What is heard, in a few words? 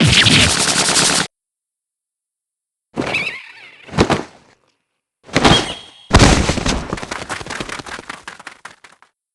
breakdown